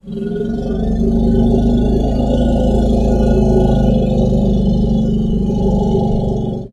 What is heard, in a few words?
Elephant Growl Singl